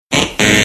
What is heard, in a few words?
buzzer sound effect